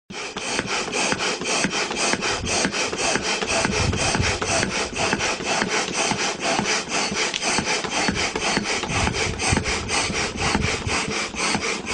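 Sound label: inflating a boat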